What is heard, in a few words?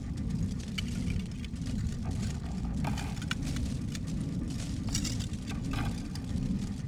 crystal ship large idle; Stellaris; other entities